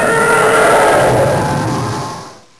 tie pass

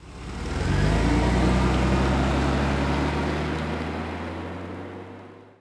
houses car; Tony Hawk's Project 8; XBOX

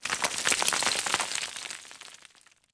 Rockslide Sm; impacts explos

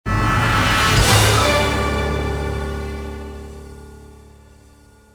swamp, music; Star Wars Jedi Knight II Jedi Outcast; XBOX; yavin swamp

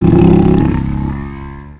angler growl; Finding Nemo; XBOX

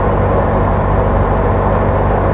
Small Car Loop

